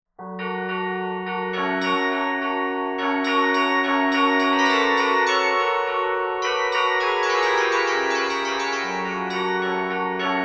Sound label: Glockenspiel; Libiamo ne lieti calici; GRASSMAYR